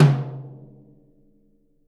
Living Drums; Sampleheads; Peter Erskine

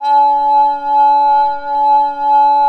RESO VOICE C#; Rarefaction - Poke in The Ear With A Sharp Stick

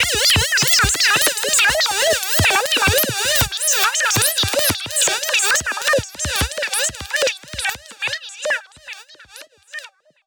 Modu Proc B 140, alarm siren samples, 140bpm; musicradar

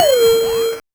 Noise, 8-bit, Bitty Noises

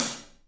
PAISTE HAT, Drums; 3D Sound

